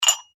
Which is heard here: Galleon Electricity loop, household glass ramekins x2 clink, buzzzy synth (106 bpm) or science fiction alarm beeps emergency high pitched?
household glass ramekins x2 clink